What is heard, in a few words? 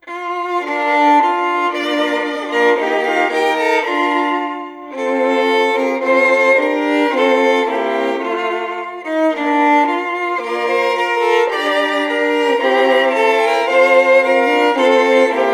orchestral